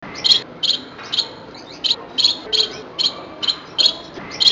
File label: Bengalese Female, gouldian finch